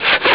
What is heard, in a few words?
SAW; PowerMonger